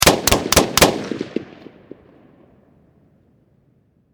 Machine Gun M16 Four Shots Close Perspective; Warfare

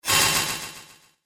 Metal Blades Of Torture; horror sound effect; Royalty Free Metal Music

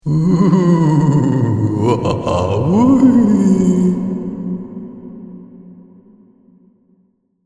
Camp Ghost, ghost sound effect; Camp Ghost In Reverse; Andrew Fleming

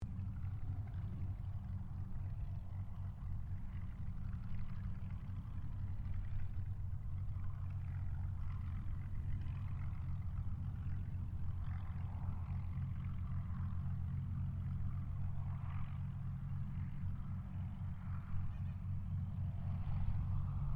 AIRCRAFT P-40 KITTYHAWK TAXI DISTANT STEREO; P-40 Kittyhawk / Warhawk / Tomahawk; Airplanes

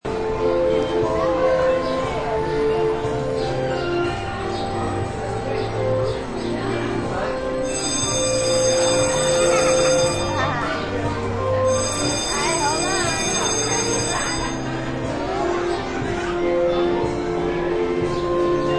Mrry Go Rnd, Merry-go-round at a theme park.; Ride Sound Effects; Games

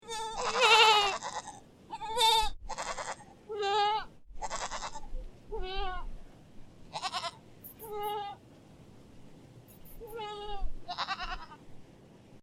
Goat Various Baby Goats, Goat Bleating sounds; Goat Sound Effects

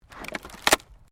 WOOD PLANK SPLIT

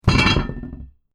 Heavy Chain Impact; Chain sounds; Metal Sound Effects

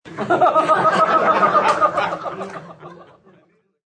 Crwd Lgh, A crowd laughs; Laughter

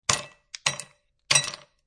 Ice Cube Throw Three, ice cubes thrown into glass; Two ice cubes thrown into glass.; Party (Sound Effects:Bars)

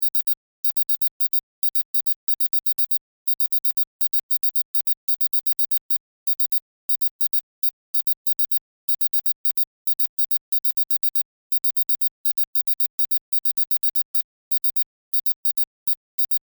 Science Fiction Teletype Readout Beeps; Communications

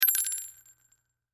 Bullet Shell; Bullet Shell 3030 Drop Cement; Warfare; Blastwave

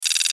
DIGITAL TEXT TYPE; Multimedia; Sound Design; Blastwave

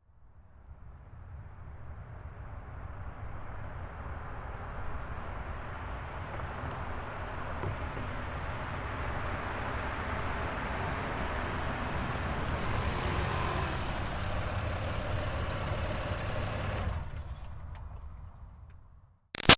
Car Highlander Up Stop Slow; 2005 Toyota Highlander V6; Cars; Vehicles; Big Room Sound

